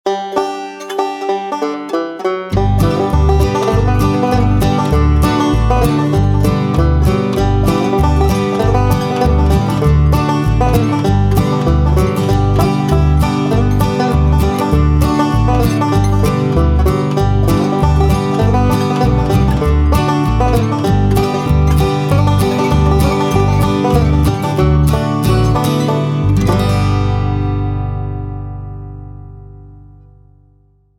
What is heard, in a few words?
Country Stroll; Duck Dynasty